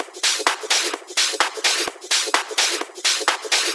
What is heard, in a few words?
House Loop, 128 BPM; Vengeance Samples